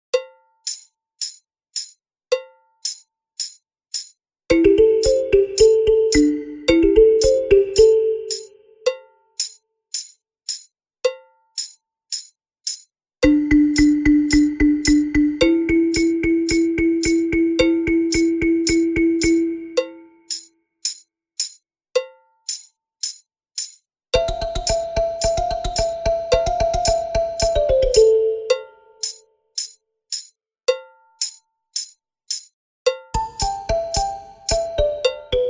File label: balafon; balafon creation vx3